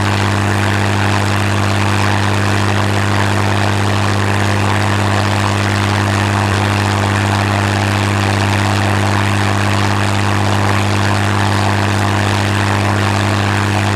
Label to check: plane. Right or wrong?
Right